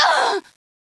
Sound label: Grunt; Lewd SFX